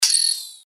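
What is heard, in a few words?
hi freq high frequency chirp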